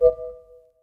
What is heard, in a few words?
mail sent